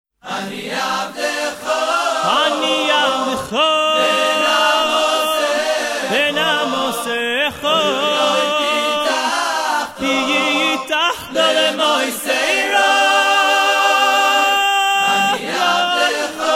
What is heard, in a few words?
acapella